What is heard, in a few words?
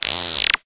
frog